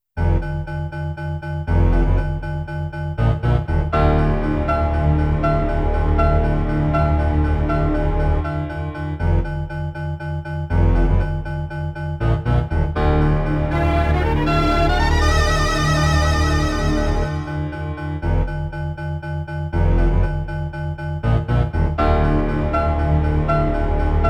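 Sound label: background music; Machi Unmei no Kosaten Tokubetsu Hen; PSP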